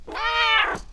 mreow; ゆめ2っき

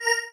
marimba spacesuit; ゆめ2っき

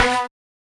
marimba steeldrum; ゆめ2っき

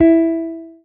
marimba guitar; ゆめ2っき

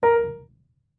marimba piano; ゆめ2っき

